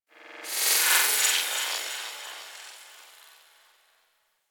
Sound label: Tech Texture Small, Ambience; Warframe; Cephalon Weave; DE